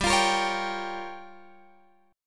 G Minor 7th Flat 5th, Guitar Open F; Gm7b5; strummed; x,14,17,17,16,14

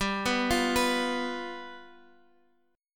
G 7th G down Guitar Standard; G7 arpeggiated; JGuitar

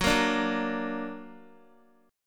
Gb Augmented, down, Guitar; strummed; 4E,4A,5D,5G,5C,6F

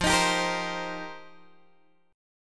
F 7th Suspended 2nd Suspended 4th, Guitar Open G

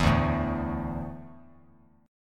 D Suspended 2nd D down Bass Standard, chord; strummed

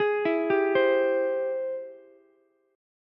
Ab Augmented Major 7th Ab Ukulele; arpeggiated; Ab+M7; JGuitar

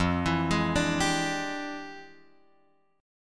F Minor Major 13th, F; FmM13; {1 X 2 1 3 3} arpeggiated

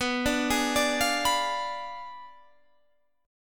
B Diminished 7th B down Guitar Standard; Bdim7 arpeggiated

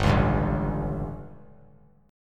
G Suspended 2nd Flat 5th G, chord; Gsus2b5 strummed; JGuitar

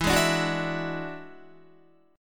E 9th E down Guitar; strummed

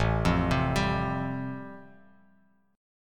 A-Minor 7th Sharp 5th, Bass Standard, chord; Am7#5 arpeggiated; 5,8,10,12; JGuitar

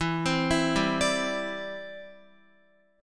E Minor 7th Sharp 5th, down, Guitar; Em7#5; arpeggiated

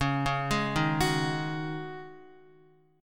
Db Suspended 2nd Suspended 4th Guitar, chord; arpeggiated; JGuitar

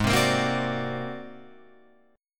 G sharp 9th Flat 5th G sharp Guitar Open F; G#9b5 strummed; JGuitar

